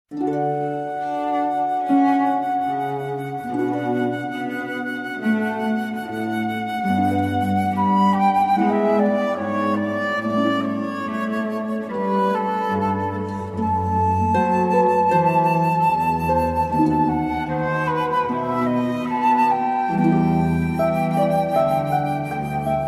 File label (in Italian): Aria sulla 4 corda arpa violoncello flauto; arpa voce violoncello flauto